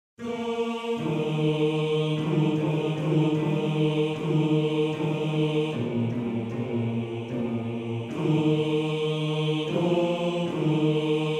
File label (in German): Bass; Bass 2